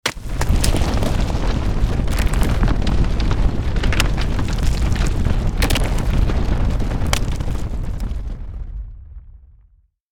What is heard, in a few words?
Volcano rumble deep powerful; nature